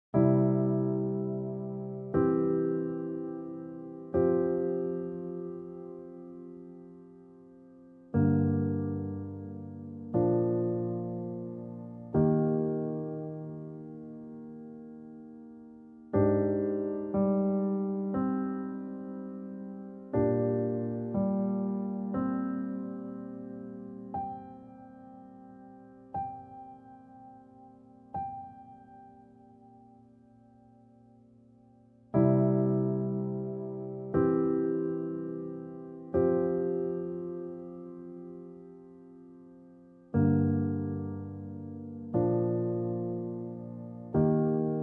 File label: piano; 감성 BG; Bedrootpiano